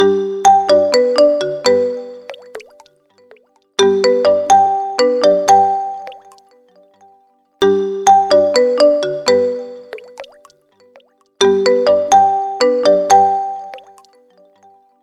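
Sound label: After The Rain, alarms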